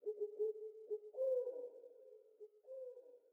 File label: sting owl; Rust